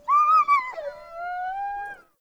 Wolf Whine Concerned; Animals; Perception

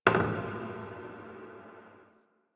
Wooden Creak Small; Ambience; Warframe; NineteenNinetyNine

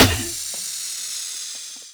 Car Tire Pop

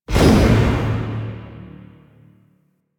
Throw; Painful Reprisal; Warframe; Pax Duviricus

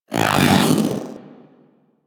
Vocal Attack Melee; Warframe; Pets; Zanuka; Zanuka Companion A

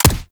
Weapon Punch Rifle

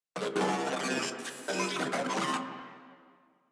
Moa Vocal Attack; Enemies; Warframe

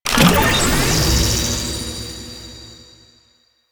Hack Minigame Success

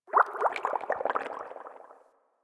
Idle Bubbles; Warframe; Yareli

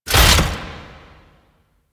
Necramech Ranged Vault Down Land; Enemies; Warframe

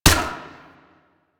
Single Viper Fire, weapons; DE; Warframe; Wraith Single Viper

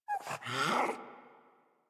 Puppy Interact Wake; Enemies; Kubrow; Warframe

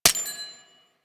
Mech, Weapons; Tenno; AK Bolto Prime

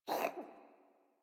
Kitten Cough Hairball; Enemies; Warframe; Catbrow

